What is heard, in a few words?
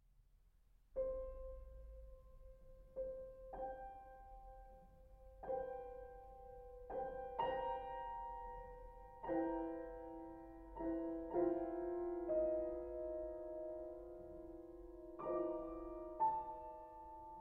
Violin and Piano Sostenuto